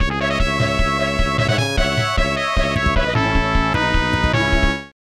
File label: victory theme; Downtown Nekketsu Baseball Monogatari; SNES